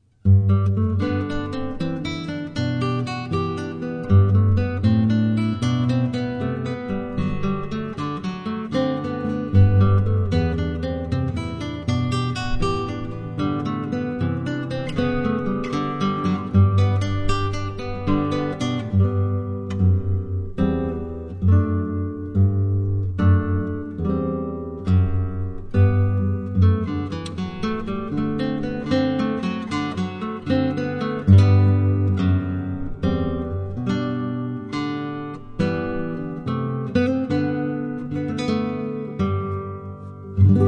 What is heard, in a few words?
Guitar; Jesu